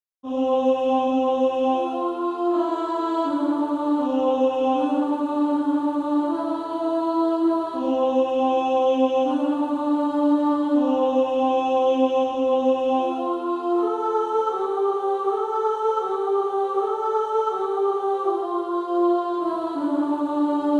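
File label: Female Choir, alto 1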